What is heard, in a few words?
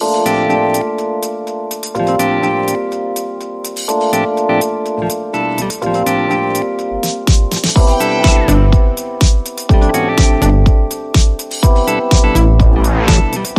ElectroSwing, Underscore